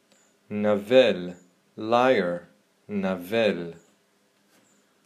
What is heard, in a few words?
Lyre